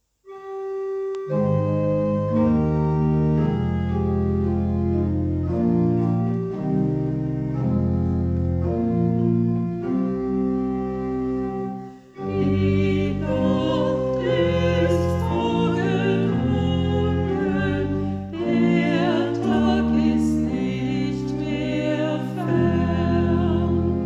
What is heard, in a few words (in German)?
Orgel Strophe 1